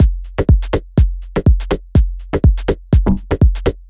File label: 123bpm, House Drumloops; Gaza; Zero-G House Fabrik Drumloops